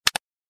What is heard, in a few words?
Computer mouse single click